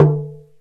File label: ROCK PERC.